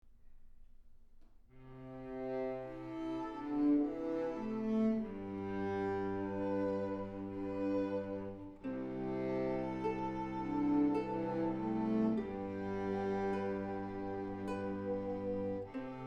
with Ukulele

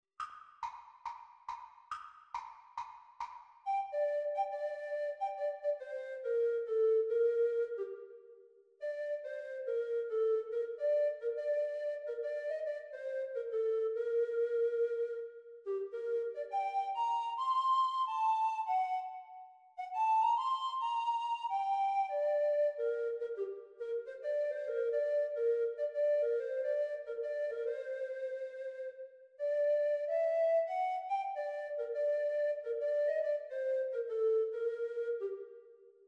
Treble Recorder; Grade 6; practise speed

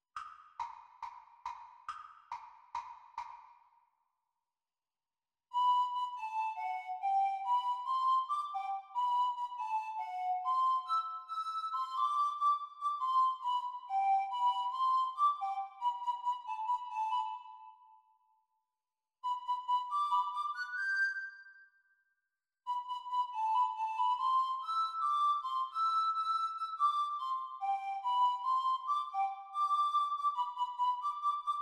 Descant Recorder Performance speed

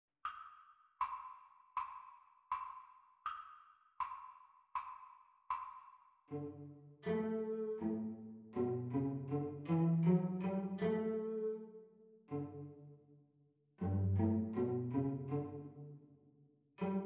Cello Duet Playalong Practice Speed

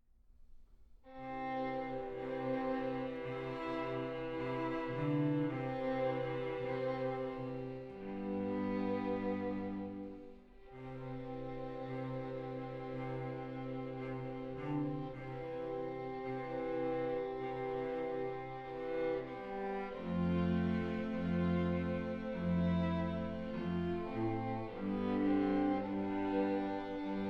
without Ukulele

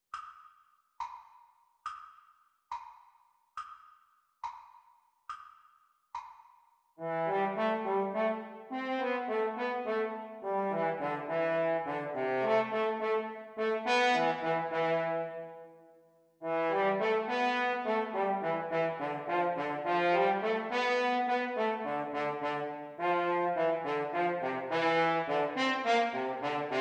French Horn Duets Playalong Practice

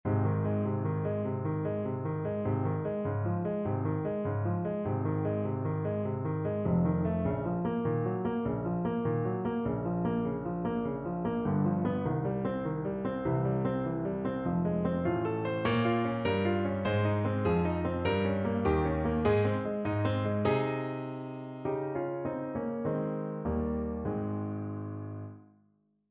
low voice octaves accompaniment; Vaccai

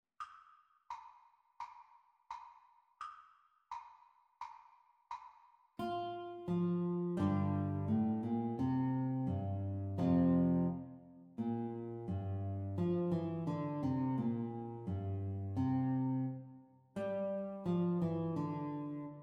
Guitar Duet Playalong Exam Speed

